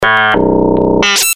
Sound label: Lo Fi Excretion, Breakers & Transitioners; Best Of N2O Library